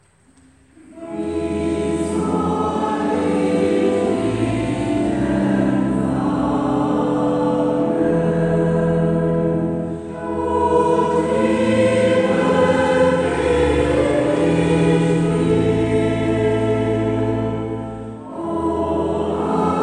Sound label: Choral; Bach